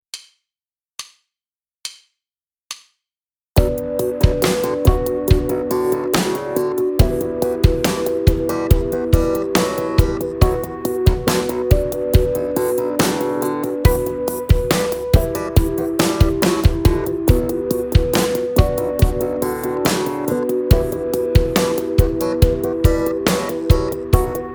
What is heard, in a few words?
Bm Em octaves groove 70